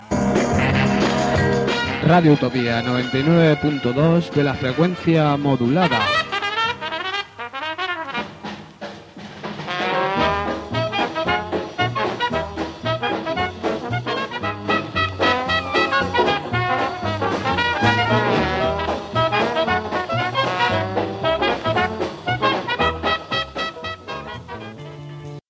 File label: jingle jazz